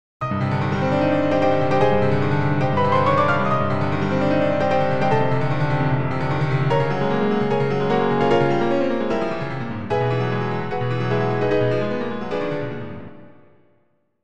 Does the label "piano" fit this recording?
Yes